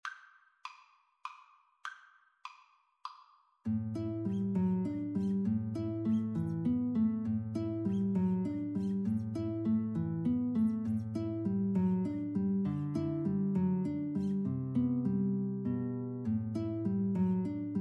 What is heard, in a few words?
Guitar Duet